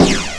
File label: beat, drums